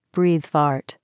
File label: bfart